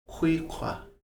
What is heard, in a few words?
whistle with fingers